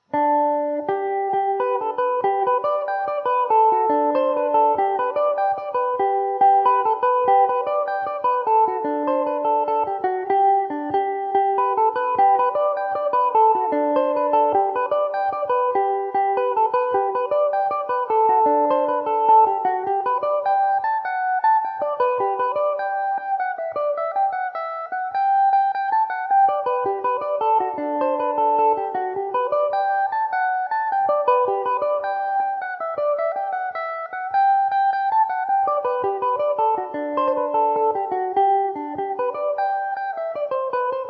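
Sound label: G major mandobird, irish mandolin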